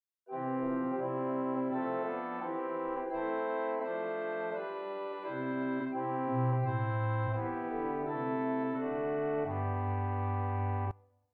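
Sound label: Swell Viol, organ; Prudhoe Methodist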